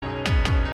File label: Acoustic Cover